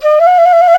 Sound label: SERULING, Heart of Asia